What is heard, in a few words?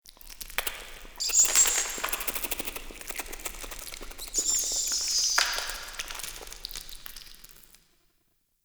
Clan Dojo Misc Mice; Dagath; Warframe; Ambience